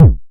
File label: analog quick, Kickbank